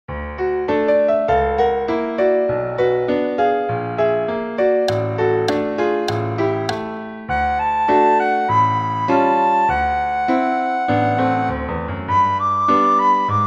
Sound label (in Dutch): sopraan; Flierefluiter 5